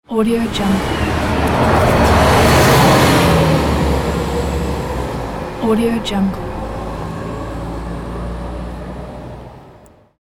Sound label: bus passby; tmpz